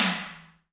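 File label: T DRM, talking drum, Heart of Africa; Spectrasonics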